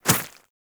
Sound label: Small Walk Dirt, Footsteps; Enemies; Warframe